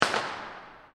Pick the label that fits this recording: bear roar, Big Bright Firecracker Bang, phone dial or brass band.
Big Bright Firecracker Bang